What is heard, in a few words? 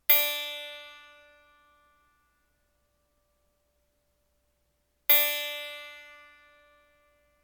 plucks